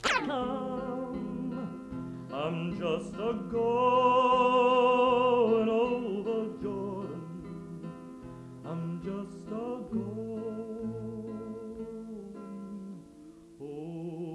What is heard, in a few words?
Wayfaring Stranger fragment; Indian Neck Festival 1963